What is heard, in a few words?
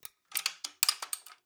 Jiggling BB Rifle